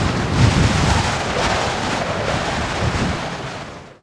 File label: arrow fly; weapons; dota; mirana